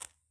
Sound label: lighter off; Items; Lighters